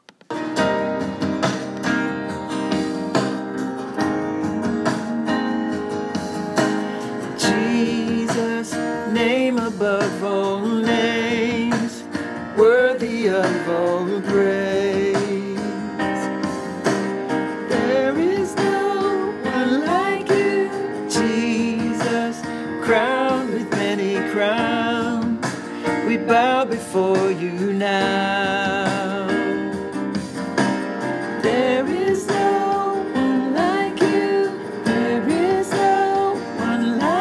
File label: Tenor